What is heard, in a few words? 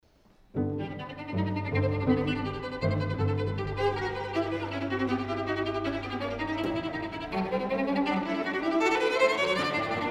Etude Reinhold Gliere; micro 4021 Dpa; concert-Duo Inning